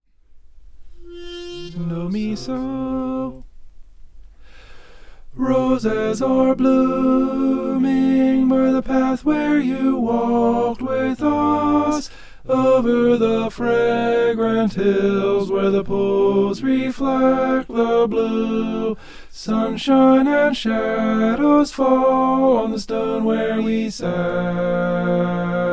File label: tenor